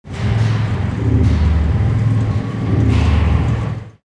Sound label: fusion; buildings